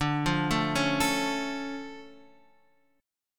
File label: D Minor Major 7th D